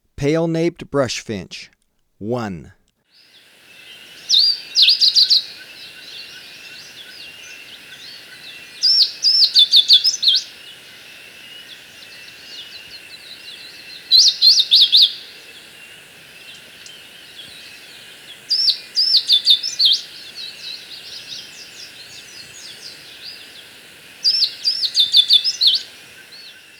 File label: Pale-Naped Brush-Finch; S. America